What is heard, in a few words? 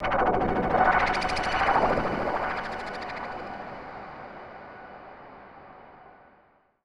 TECHNO FX 140